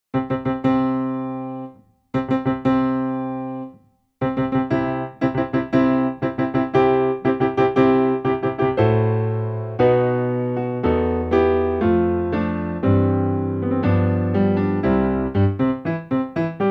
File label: wedding march piano